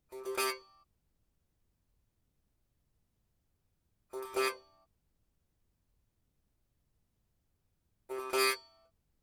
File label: sitar